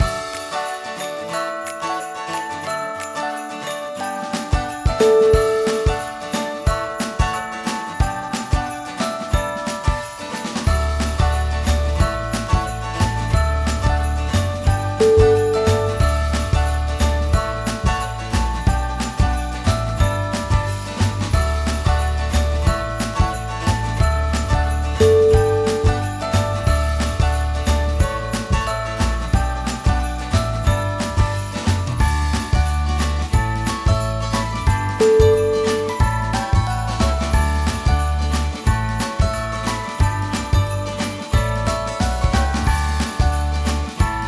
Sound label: christmas song